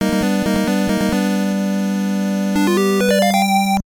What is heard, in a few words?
Game Over; Cabal